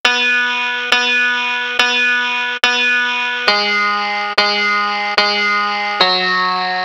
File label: piano